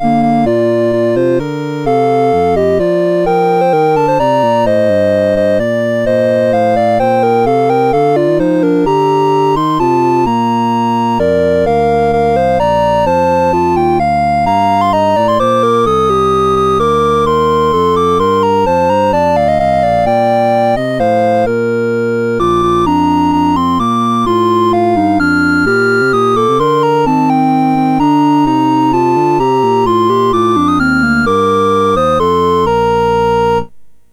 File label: Round, MOS6581; MOS6581R4